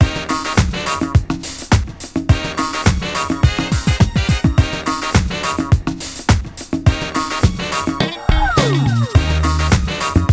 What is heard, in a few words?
Pe Class No Vocals